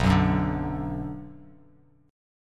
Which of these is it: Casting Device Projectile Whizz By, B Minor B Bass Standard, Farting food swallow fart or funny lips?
B Minor B Bass Standard